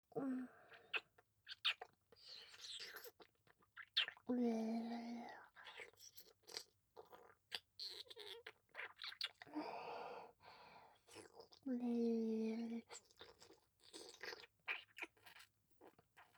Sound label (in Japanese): 乳首舐め・普通